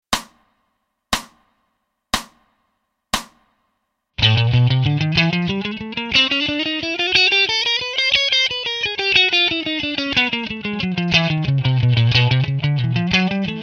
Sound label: Pick & Fret Hand Sync